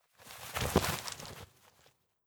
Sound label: Clothes; Colosse Mumie; Legend of Keepers (gamerip) (2019)